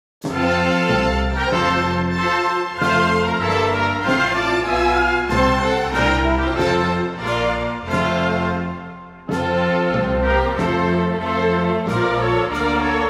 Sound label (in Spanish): himno de navarra instrumental; himnos autonomicos